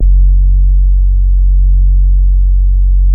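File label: SUPPORT BASE, BASSES; Masterbits; Vintage Voltage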